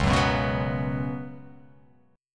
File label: C sharp 7th Suspended 4th C sharp